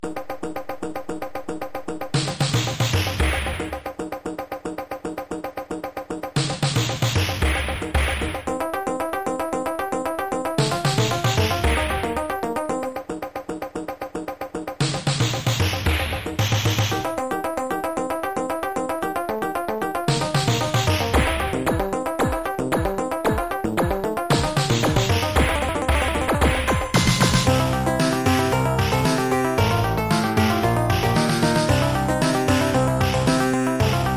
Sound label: percussions; Pop Electronic; Experiment